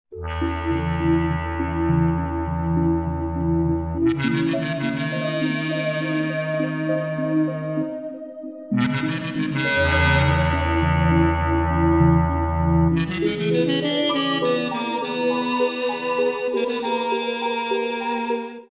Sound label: underwater trumpet filter intro; U-boat